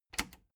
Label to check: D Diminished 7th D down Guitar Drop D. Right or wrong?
Wrong